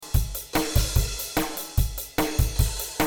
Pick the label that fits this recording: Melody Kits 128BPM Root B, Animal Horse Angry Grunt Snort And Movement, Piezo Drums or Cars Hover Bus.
Piezo Drums